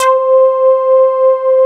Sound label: JV BASSIC; Syntec; Wall of Sounds